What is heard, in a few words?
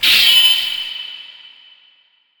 Anime Sword Hit